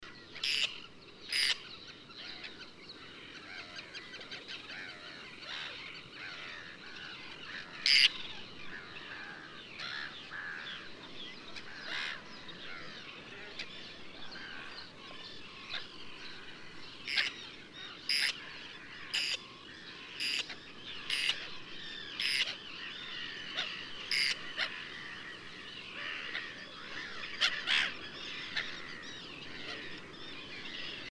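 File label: whiskered tern hybrida adult long, black-headed gull; gr-rosin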